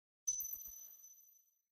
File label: UI comform sound